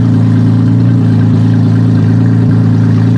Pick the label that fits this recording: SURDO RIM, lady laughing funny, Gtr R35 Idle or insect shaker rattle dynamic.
Gtr R35 Idle